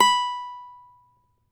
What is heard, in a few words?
CAVAQUINHO B, Guitars; Spectrasonics; Hans Zimmer Guitars VOL-2